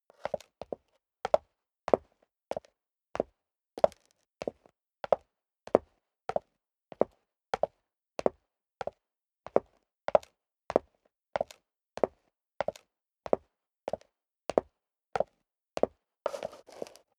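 step high heels concrete downstairs sequence, footsteps; schritte; menschen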